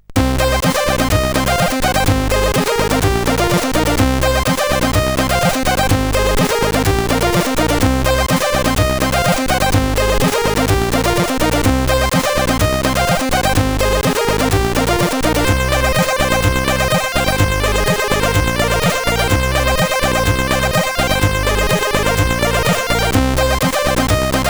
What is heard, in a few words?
Rave Beat, CSG8580R5